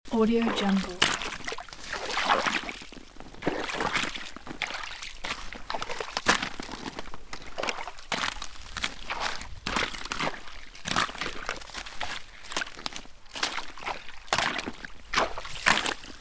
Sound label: walking in frozen swamp loop